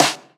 snare hybrid; Sonic Foundry (Sony Creative Software); Ugly Remnants VOL-2; Beatstuff

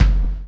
CHAIN; Spectrasonics - Backbeat

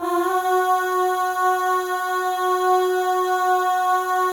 AHS, FEM; Spectrasonics; FEM.1 AHS

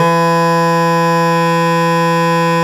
BASS SHAWM; SoundBytes - Early Patches

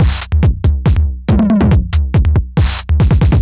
retro drum machine Loops 140bpm; Copicat

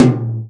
tom, Real Drum Kit, jungle kit

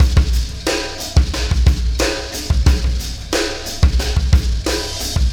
Alien Influence Beat, Processed Drumkits

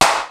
XD-5, DRUMS PERC, BOSS

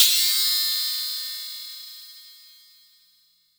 Metal

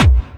KIT HOU; Best Service

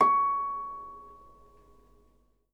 H C#6, Percussion & Harp; Miroslav Vitous

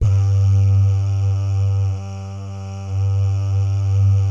BAH, BAHS GUYS; Spectrasonics; Vocal Planet; Jazz FX

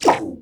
tech fx; Best Service; Hyper Dance; EFFECTS

